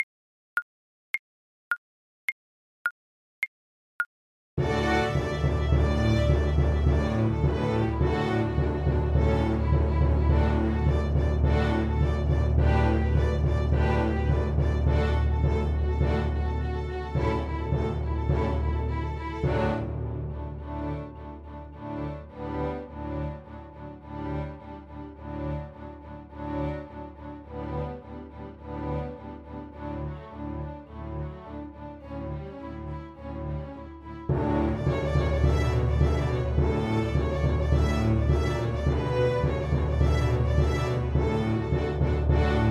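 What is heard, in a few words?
VLC karaoke, Cello; Capriccio Espagnol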